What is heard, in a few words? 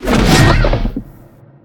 throw; smite; chaac